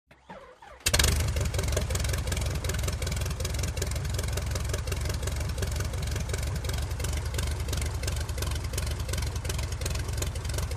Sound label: Revs To Slowly Throbbing Idle, Series Of Faster Idles With Growling And Humming Props. Distinct High Whirring Of Fans And Engine. Sputters To A Stop, Tiger Moth